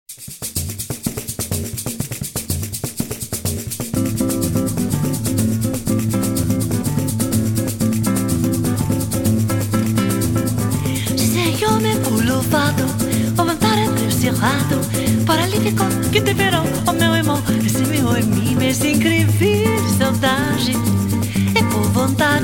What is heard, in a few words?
Latin Band